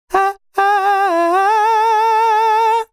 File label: Ad Lib Dry, 150 BPM E Min, Vocal; Cymatics; Euphoria Vocal Sample Pack; Ad Libs